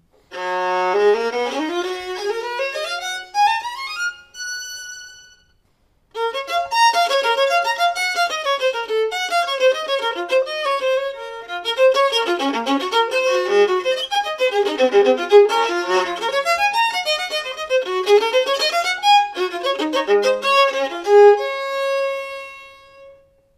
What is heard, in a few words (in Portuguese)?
Violino